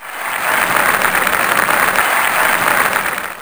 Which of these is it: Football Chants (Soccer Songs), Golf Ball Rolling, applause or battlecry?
applause